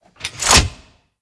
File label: Throttle; blue archive